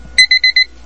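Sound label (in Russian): звук уведомления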